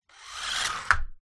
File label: leisure radio controlled car hit wooden object; zapsplat